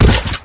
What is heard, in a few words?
limbs, flood